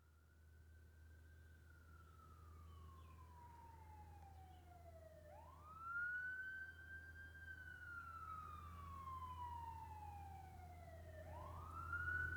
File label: Police Car, Pass R To L With Wail; Emergency; BBC Sound Effects Library